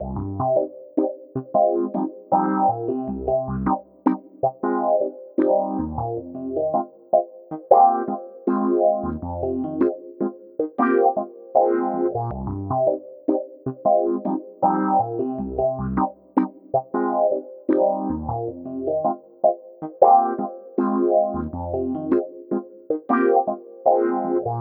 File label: clav, loops